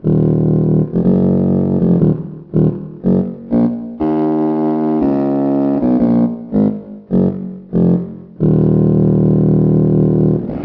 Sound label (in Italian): fagotto